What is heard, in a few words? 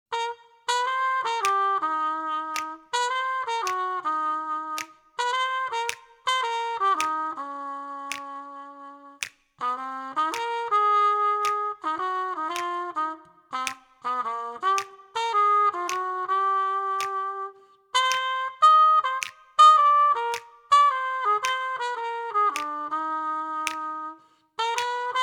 Blues